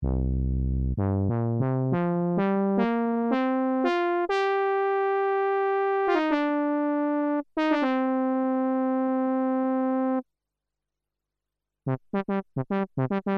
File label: ob-xa; Brass